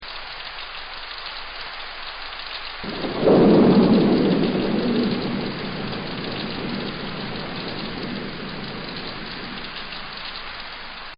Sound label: Rain and Thunder; Weather; Partners In Rhyme